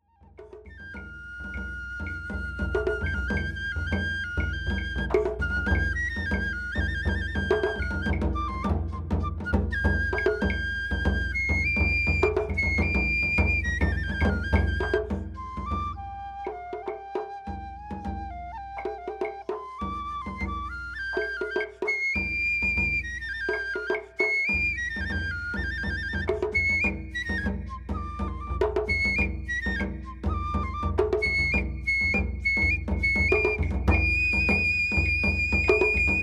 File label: solo live